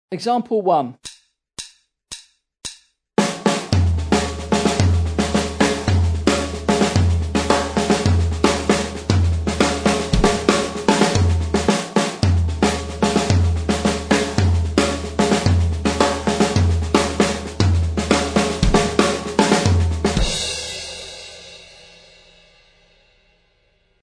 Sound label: drum set; Independence day 20